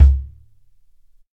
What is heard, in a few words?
roomy tom sound c sharp key